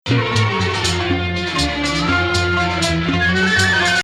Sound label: S-B 5th, maqam; Rast